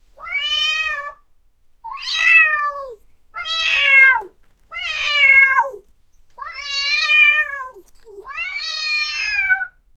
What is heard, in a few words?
cat screamer